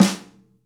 Rock Snare, Roland BK-5; Snares

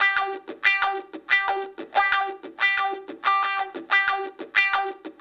Call And Response Guitar, 70s Analog Funk; Sonic Foundry (Sony Creative Software); What it Is!

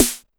Snare, Pitched Up; Clavia DDrum-AT

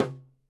Tom, EMU PX-7 Drum Machines

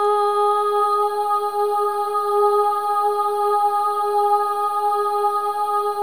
SOLO BOY, AH; Spectrasonics; Symphony of Voices

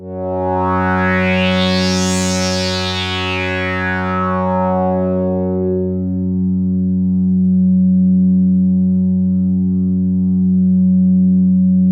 H.SWEEP, BIG SWEEP; Masterbits; Vintage Voltage

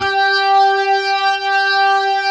G5, OCT DIST ORG; Sonic Reality; Vintage Timetraveler

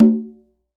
Conga Lo; Roland SPD-S

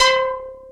big seq C5; Q Up Arts; Dream Experience; DANCE

